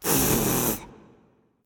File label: tongue; smite; scylla